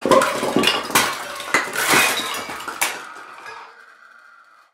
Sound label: objects falling yes